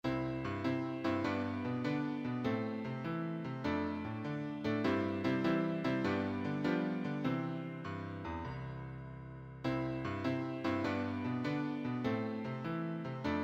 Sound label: piano acc; church and sunday school music; Hymns